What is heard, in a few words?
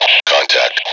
back door